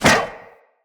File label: Zipline Brake Foley Contact; Gadgets; Parkour Zipline